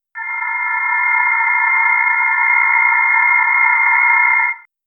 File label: text highlight, ui ux